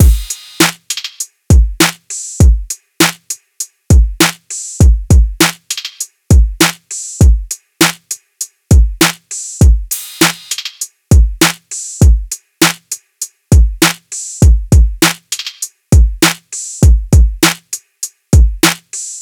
drums 100, Full Drum Loops; drum sample pack; Mahawu; SEISMIC; Slate

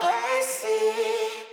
phrase F, Vocal; Slate Digital Omnivox Vocal Sample Pack

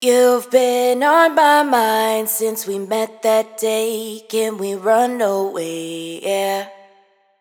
hook Run Away 125 Em wet, Vocal; Slate Digital Omnivox Vocal Sample Pack

